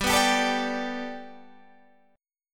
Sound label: G Major Add 9th, Guitar, Drop D